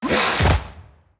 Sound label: war knockdown